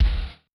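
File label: bass drum, Kick, Yamaha DTXpress-II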